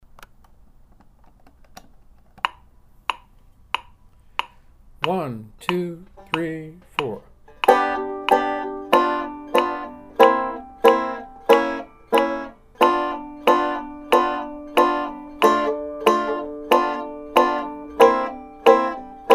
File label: G jazz blues chords